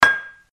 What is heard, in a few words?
ding; millikan; QuantumPhysics